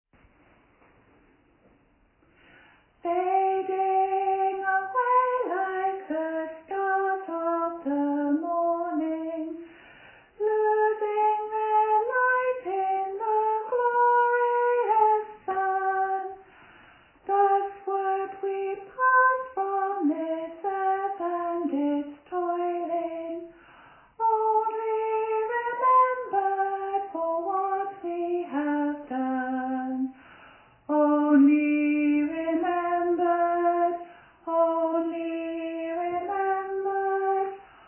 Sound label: tune